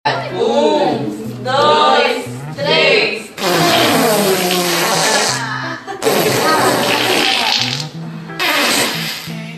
group fart

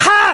haa

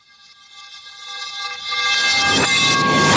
reverse metal pipe falling

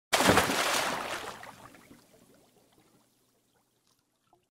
water splashing

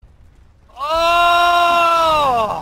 moan

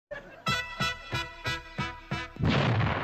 explosion

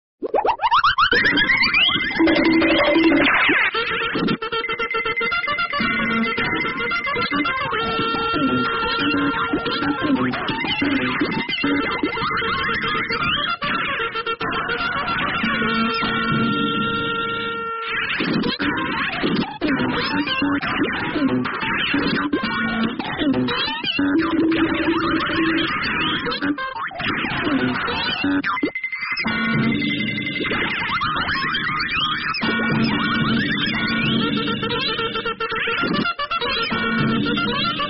goofy ahh sound

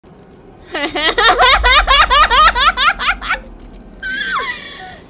psychopathic laugh

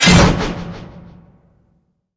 missed swing; grave robber

